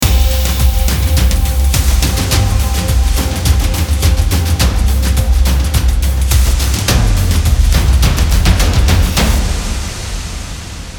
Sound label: fanfares